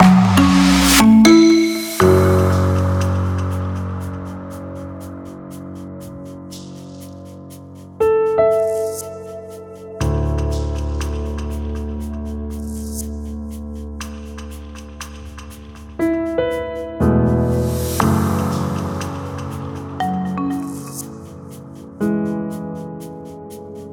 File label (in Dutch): Logomuziek Bedje Rustig